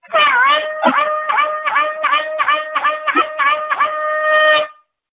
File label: hunting bugle ringtone